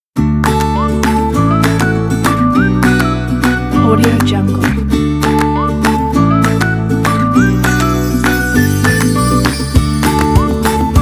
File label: be cheerful; dmytroignatov